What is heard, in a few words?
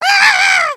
freakout; emotes